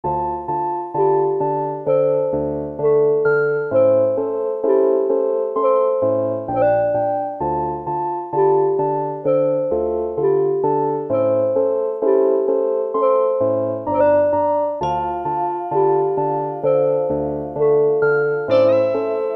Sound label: Loop